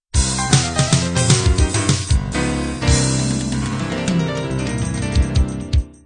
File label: boogie woogie end